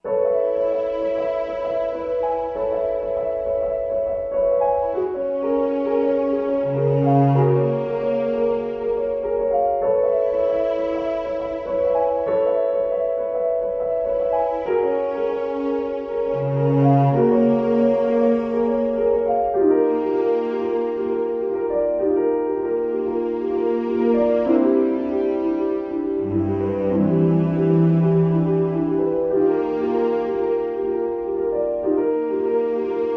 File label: With Strings